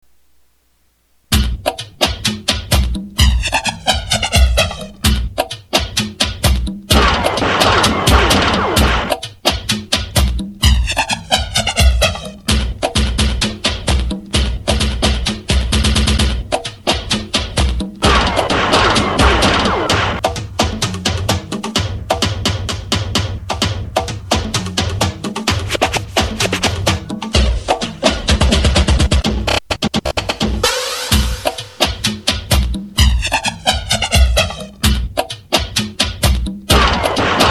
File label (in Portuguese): base funk